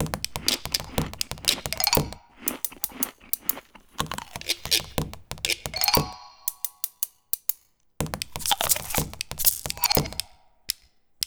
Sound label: concrete loop; arras; Jean Christophe Quilez